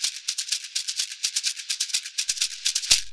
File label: shekere; reciclar tocar